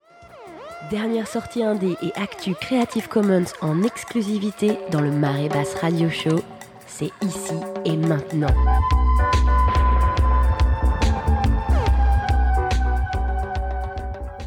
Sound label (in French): jingle mareebass